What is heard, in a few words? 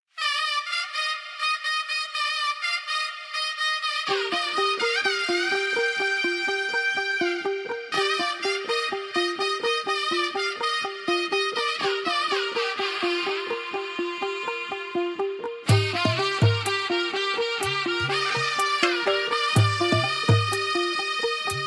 song ringtone instrumental tamil